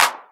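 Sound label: clap, Boss DR-202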